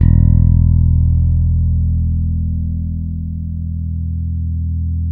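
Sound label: 6-string fretless bass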